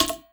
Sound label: vent step; headcrab classic, scripted; creatures